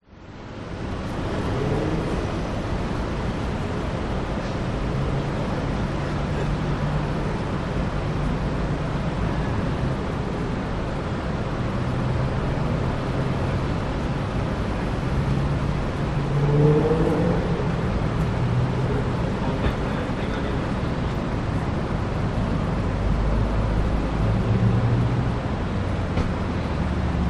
public places car park multi storey top level open cars people